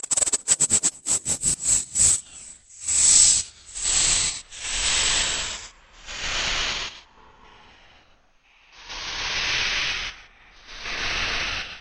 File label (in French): scotch